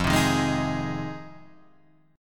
F Minor 7th F Guitar Standard; strummed; JGuitar

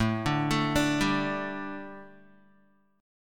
A 7th Suspended 4th A Guitar, chord; A7sus4 arpeggiated; 4E,4A,5D,5G,5C,6F; 5,5,7,7,7,x

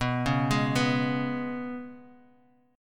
G sharp Minor Double Flat 5th B

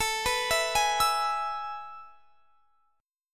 A Major 7th Suspended 2nd, chord; AM7sus2; arpeggiated; x,24,21,21,21,24

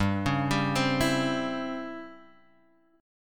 C sharp Minor Major 7th Flat 5th G, chord

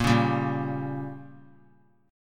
A sharp Minor 6th; strummed; JGuitar

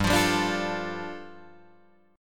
G 7th Suspended 2nd, Guitar DADGAD

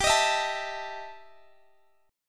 E Minor Major 7th Double Flat 5th, G

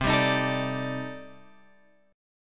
C Minor 6th Add 9th C; Cm6add9 Chord {8 10 X 8 10 10} strummed

